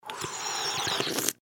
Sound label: slurp drink male